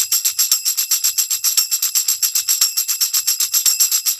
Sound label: TCH TAMB, Roots Disco; USB Soundscan vol.32; AKAI